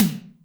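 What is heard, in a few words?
Snare Hi Wet Electric, Alesis D4 drum machine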